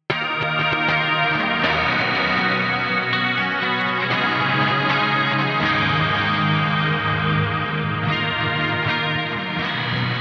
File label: RC 20